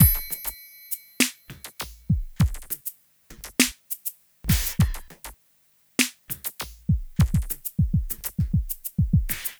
Smooth, R&B Drums

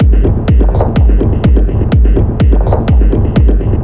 125 drum loops; M8; Electronisounds

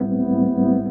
Chords Tones; Equipped Music; Loungin House

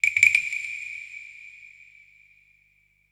SIERRA PER; Best Service Advanced Media Trax Building Blocks

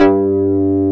Synth Bass, Analog Bs

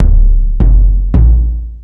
Lotsa Kicks, Drum Machines E-MU Pro-Cussion

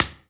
TAP, Human Perc, Body Perc; Spectrasonics; Supreme Beats; World Dance

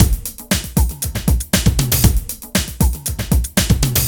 Drum Loops

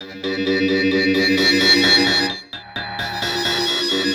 Ugly, Industrial, Nasty

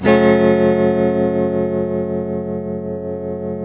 TELTMDMIN G, TELE CHORDS, Guitars; Spectrasonics; Hans Zimmer Guitars VOL-1

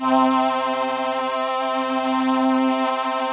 VP-330, String Machines

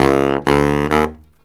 BARYT, Killer Brass Riffs

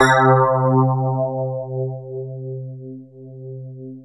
SY99 EP; KEYS; Alpine Volksmusik

